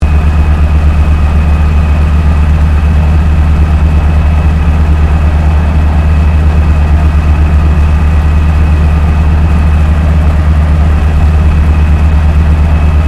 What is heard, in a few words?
AIRCRAFT AT-6D HARVARD MK III IDLE INTERIOR; Temora Harvard MK III; Airplanes; Vehicles; Airplane Sound Effects